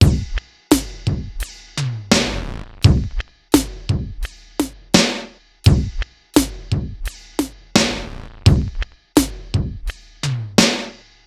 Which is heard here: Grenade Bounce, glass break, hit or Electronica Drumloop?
Electronica Drumloop